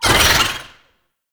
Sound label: Wall Climb Cast Vocal; Warframe; Oraxia